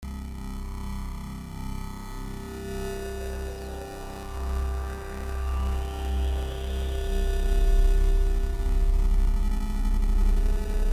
Ambience; SCP-079

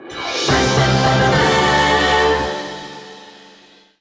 Goal Fanfare; crash bandicoot series sound box; Track and the Beanstalk; 2nd Place

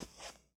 Foot Carpet Shoes Walk; Lucius III

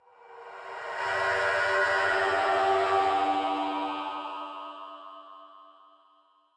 echoes; thief 2014 gamerip

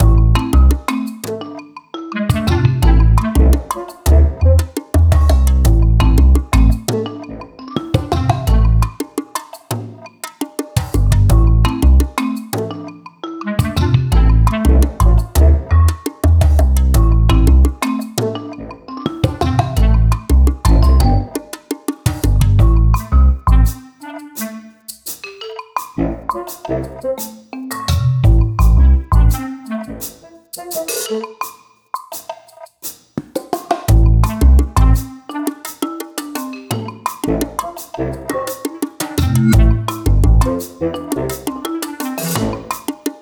Jungle Loop

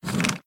Vault Rotating

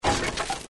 wood

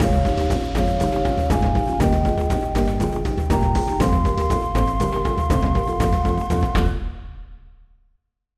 Win Level; Zuma's Revenge

